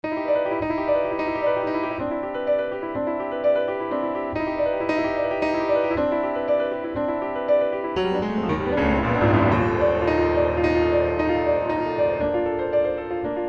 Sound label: flugel; albrechts